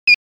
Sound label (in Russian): Триггер включен 1короткий